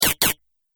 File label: Double Laser Shot; Double Laser Shot 05; Laser Gun Sound Effects; Sci-Fi Laser Sound Effect; Andrew Fleming